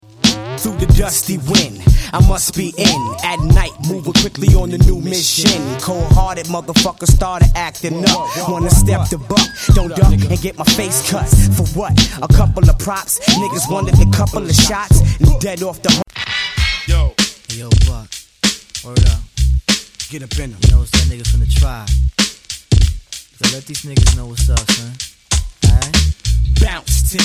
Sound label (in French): rap